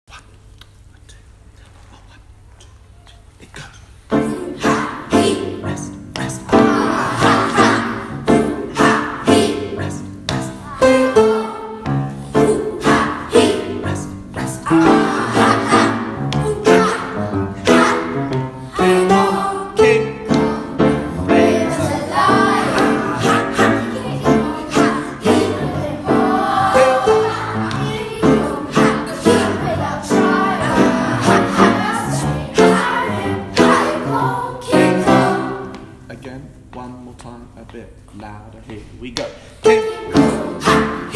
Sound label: backing